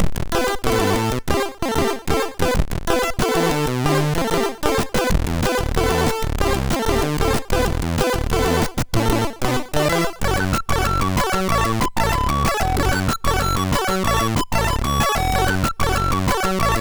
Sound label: sid MOS6581R3